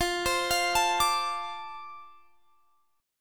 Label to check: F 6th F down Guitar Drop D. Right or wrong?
Right